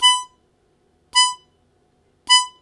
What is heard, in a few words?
Short Attack B2, Harmonica; Zero G - Harmonica